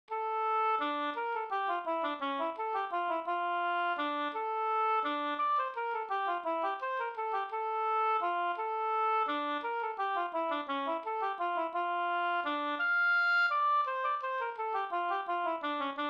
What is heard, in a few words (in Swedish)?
vals; Delsbovalsen